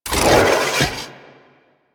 Spear Throw Charge, Weapons; Warframe; Tenno; Priest Spear

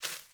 Sparrow Quick Move, Movements; Animals

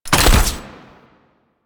Bow Shot

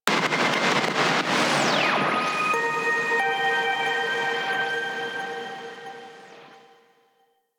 Mnemonic Static B; DE; Warframe; Night Wave

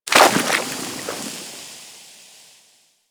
Poison Hit Effect; Warframe

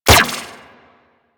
Laser Fire; Riot Moa

